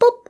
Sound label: pop; Voice Loot Filter and Voice Lines